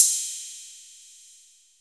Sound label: soft ride; DR.ACCESSOR